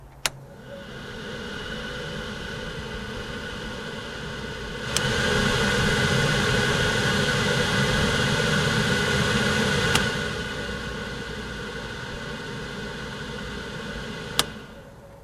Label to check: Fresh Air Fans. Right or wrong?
Right